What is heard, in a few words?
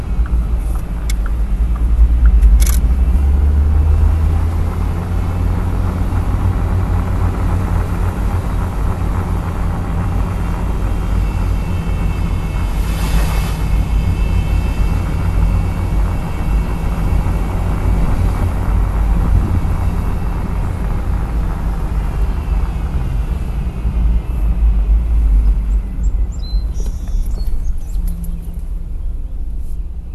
clutch actuator cylinder noise, hummer h3; h3 adventure